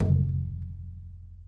small reverb tom single hit f key, Royality free tom single hit tuned to the F note; tom drum samples